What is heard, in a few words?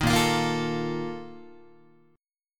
Bb Major 7th Suspended 4th, Guitar; strummed; JGuitar